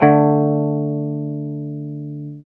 guitar 5TH

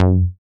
short bass; Masterbits; Dance Trax

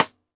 Rim; Roland MV8800

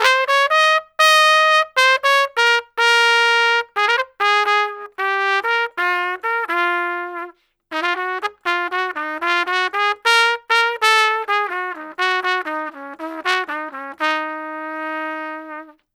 Trumpet Solo, Jazz Traditional; Sonic Foundry (Sony Creative Software); Jazz Solos and Sections

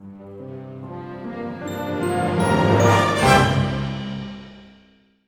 FLY; East West; Scoring Tools; ORC; Flying Star

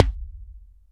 Kick, Akai MPC500; Toolkits

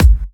Dubstep Kicks; Vengeance - Essential Clubsounds Vol.5; Bassdrums

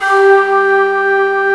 LEAD, HOUSE

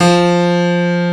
GRAND F2, PIANO; GRANDMF

